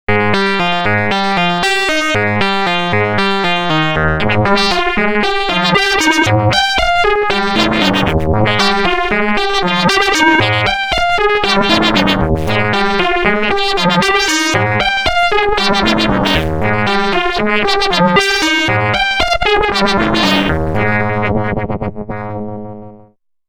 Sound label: Dreadbox Polyend Medusa FM